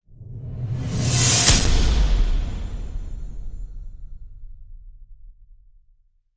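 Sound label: Whoosh Steam Terminal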